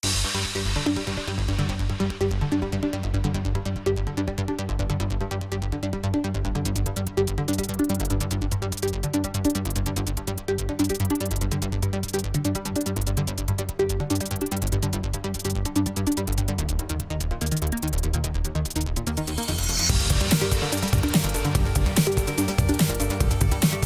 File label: level music